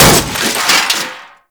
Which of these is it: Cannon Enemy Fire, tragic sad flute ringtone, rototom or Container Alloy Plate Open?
Container Alloy Plate Open